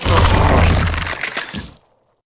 Elven Tree Die; Seer; GamigoNA

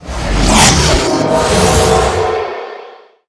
Blood

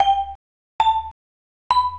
SFX Slot End; Seer; GamigoNA

